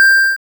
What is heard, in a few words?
coin; Mario platformer